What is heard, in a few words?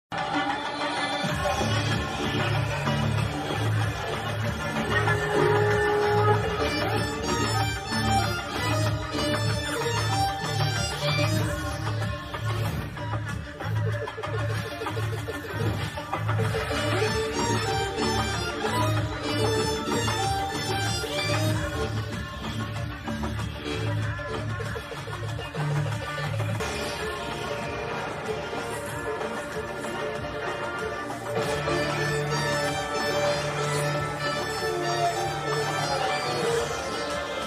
interval bgm; Swag movie